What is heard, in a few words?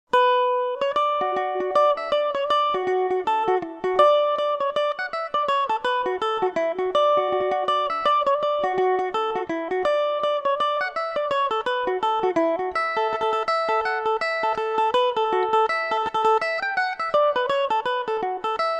dublin reel dmaj, mandolin